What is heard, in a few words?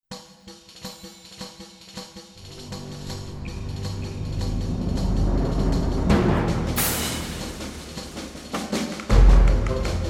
Orchestra; Masterbits